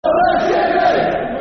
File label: Football Chants; Cardiff City; fanchants-com